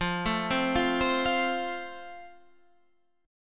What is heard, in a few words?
F Major, Guitar, Standard; arpeggiated